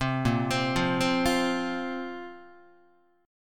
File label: Bb Suspended 2nd Bb Guitar; Bbsus2 arpeggiated; 3,6,3,3,4,4; JGuitar